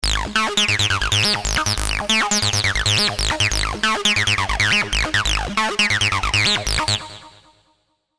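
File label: Sequence Acid, Sylenth1; Supreme; alonso 19 year anniversary pack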